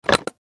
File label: Button Switch